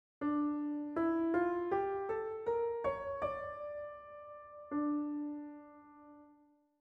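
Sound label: D minor scale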